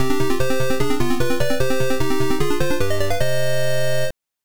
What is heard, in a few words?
All Clear; Vampire Killer; MSX2